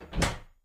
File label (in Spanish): cerrar caja